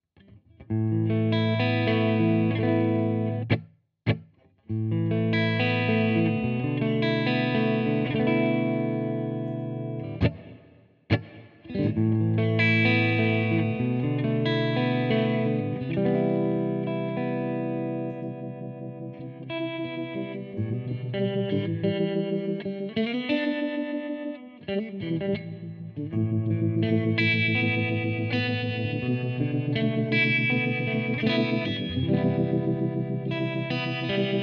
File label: Clean Amp LP